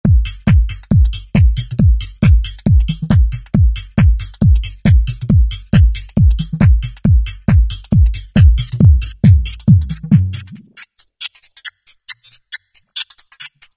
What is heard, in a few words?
Hard House KIT, Roland V-Synth; Factory Patches